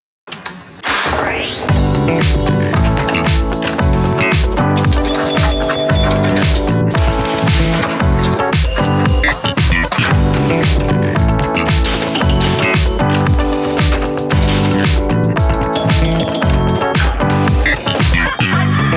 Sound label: Disco